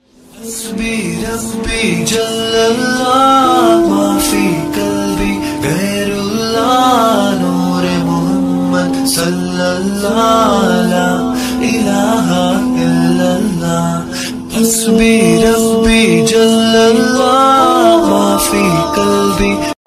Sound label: Naat Sharif Ringtone